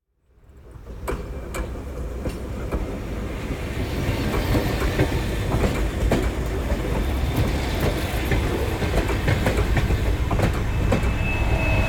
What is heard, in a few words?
Train Passing By Long European Train Composition - Slow Speed - L to R; Hollywood Edge; sound designer's toolkit v2